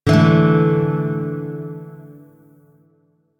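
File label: Shawzin Pentatonic Major Chord; Warframe; Lotus Shawzin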